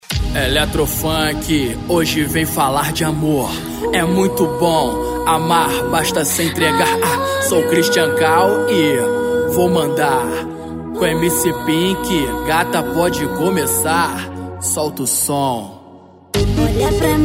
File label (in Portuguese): eletro funk; cd balada eletro funk vol4